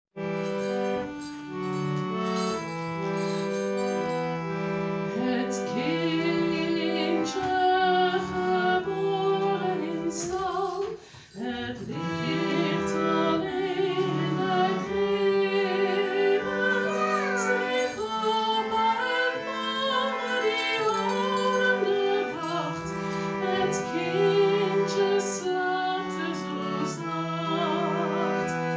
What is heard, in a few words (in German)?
weihnachtslied